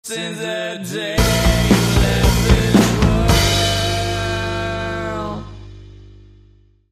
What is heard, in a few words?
Bumper